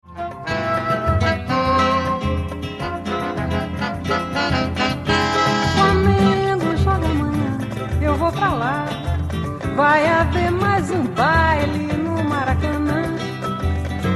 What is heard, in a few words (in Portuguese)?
Samba, Coro